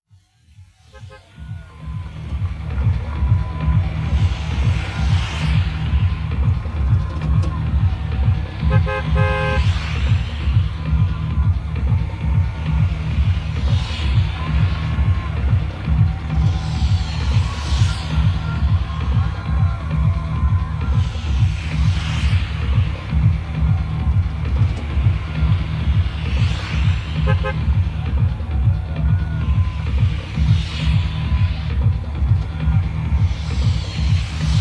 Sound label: transporter sound; cstrike; pubg